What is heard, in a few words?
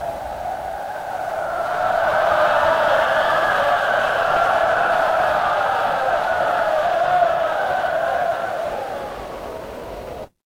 crowd cheer